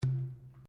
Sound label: Wooden Column Impact Fist